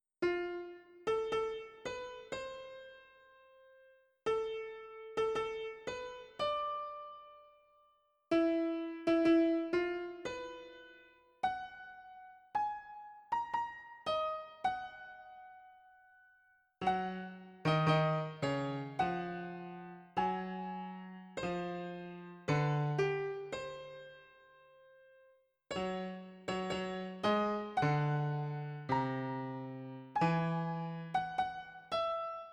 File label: Yamaha Disklavier Pro